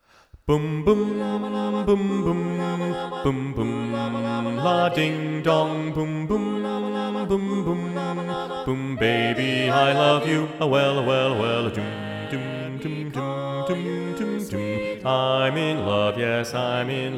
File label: BASS, SATB Bass Predominant